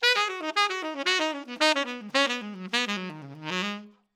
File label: Tenor